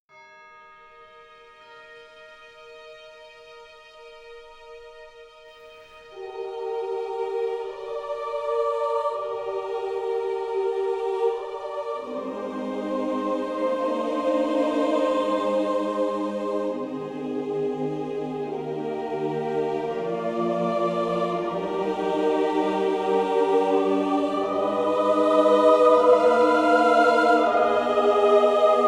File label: choir; CO Prima Luce